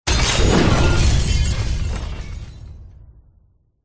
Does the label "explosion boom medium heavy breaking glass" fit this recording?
Yes